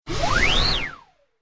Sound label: Whistle Whoosh; Whoosh Sound Effects